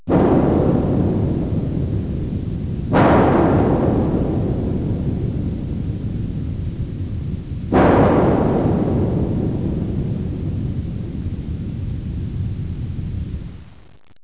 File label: bangs